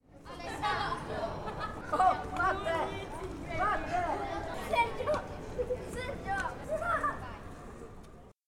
Enfants Riant

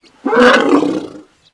Elephant Rugissement

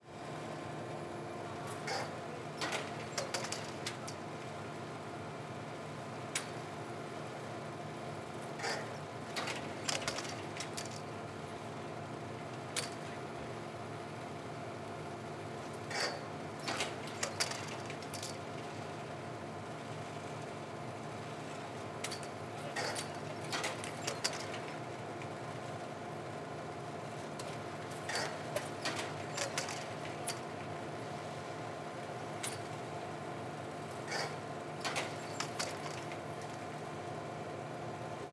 Remont E M Canique Ski Moteur

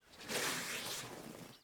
Elephant Crachat

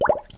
Bulle

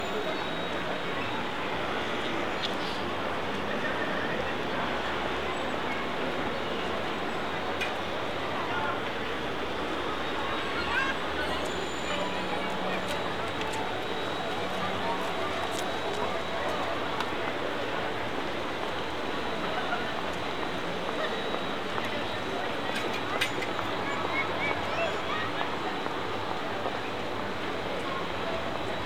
Ambiance Exterieur Ville

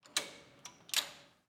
Ascenseur Ouverture Porte

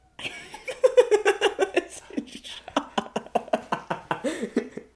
Rire

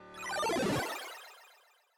Arpege Haut

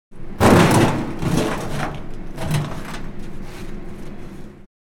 Poubelle

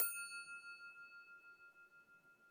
Crystal Frappe Doux Resonnance Fa

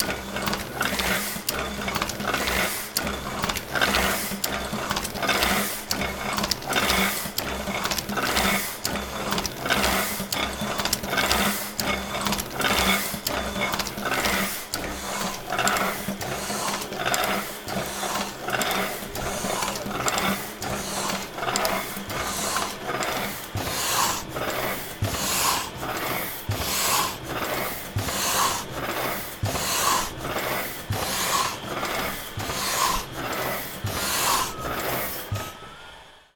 Presse a imprimer typographique; Cedric Peyronnet

